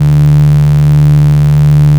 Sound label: additive cello single; FFTimbre